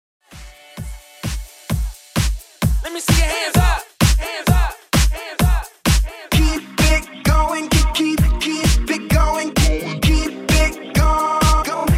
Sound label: Uptempo Mix